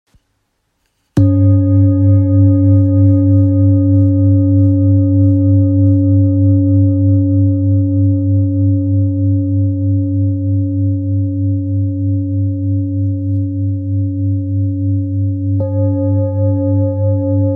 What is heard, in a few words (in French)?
Bol therapeute sol 1.545kg